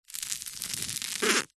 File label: office parcel tape strip stuck to carpet finger rub along